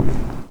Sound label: Foley lo